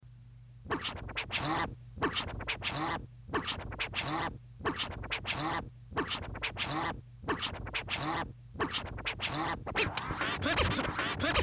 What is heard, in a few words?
scratch; VINYL 33